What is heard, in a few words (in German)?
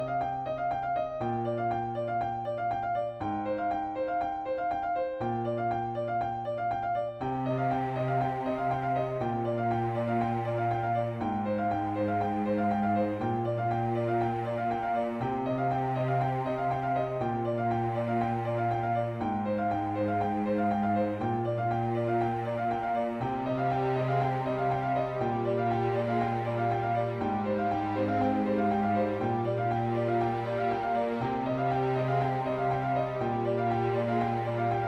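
Neptuns Garden für Streicher Klavier und Schlagzeug; Tonart: c-Moll; mit Streicher, Klavier und Schlagzeug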